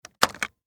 Technology DV Camera Eject Battery Panasonic DVX 1080 Camcorder